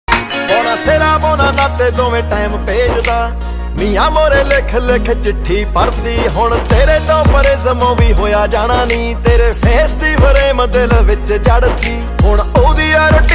punjabi song ringtone

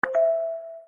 notification; xnxx

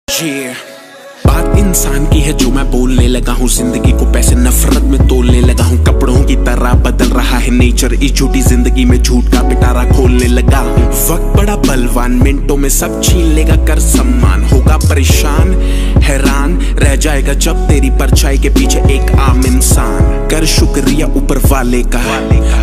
Punjabi

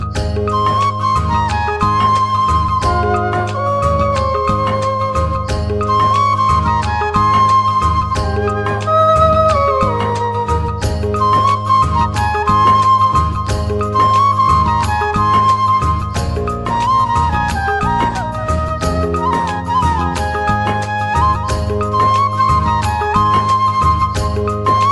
Flute

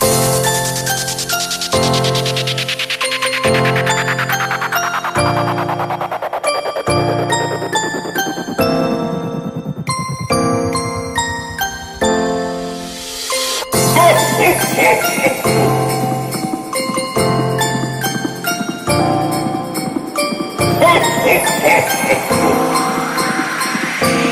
jinglemix jingle bells

